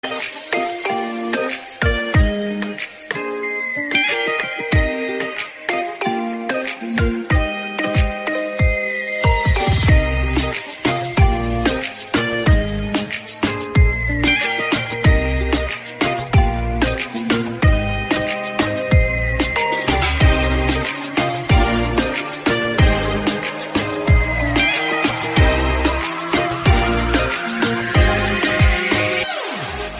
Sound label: inst